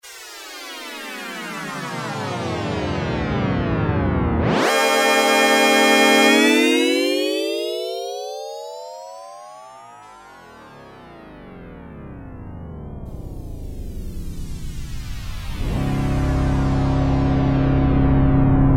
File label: TAKE OFF, Yamaha DX7